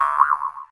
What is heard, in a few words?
Chat Ringtone